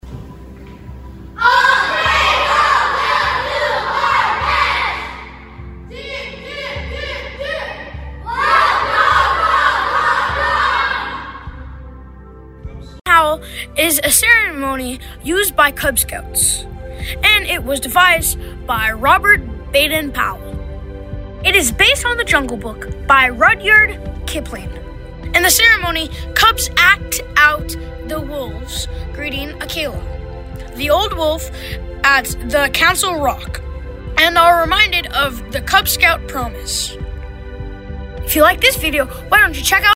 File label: The Grand Howl